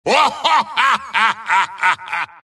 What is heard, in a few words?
wraith laugh; dota; skeleton king